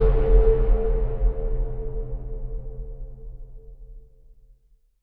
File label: LOW END IMPACTS; LOW END IMPACTS VOLUME ONE by ROHHSA